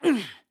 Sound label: Throw; BOTW; Breath of the Wild; Link